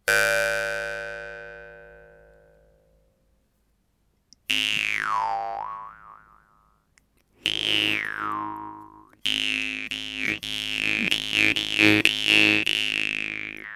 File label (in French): DROMBA, guimbardes